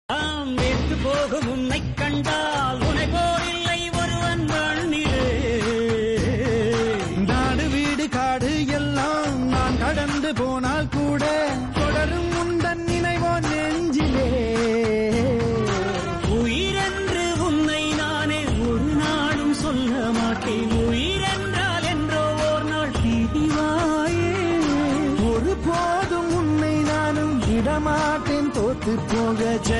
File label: emotional bgm; jilla